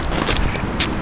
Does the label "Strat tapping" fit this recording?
No